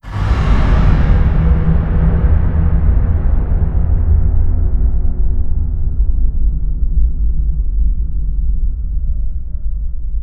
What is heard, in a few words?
sfx star eater weapon hit distance; Stellaris; nemesis